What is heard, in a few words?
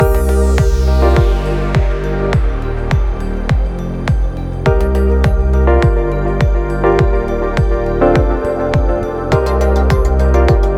Race Win Sting Loop; Rebel Racing; mobile